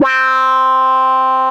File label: Talkbox Solo, Synth Lead; Bass; Ableton; Creative Extensions